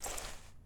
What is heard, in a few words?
Shotgun ADS Out